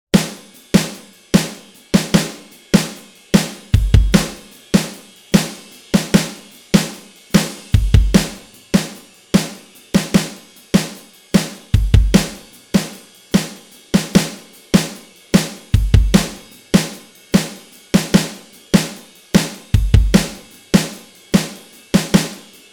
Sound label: drums